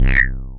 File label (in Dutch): Roestige schop, FM; Polyend